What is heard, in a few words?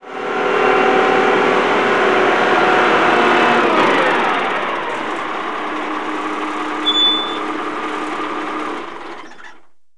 Case 680 Tractor, Construction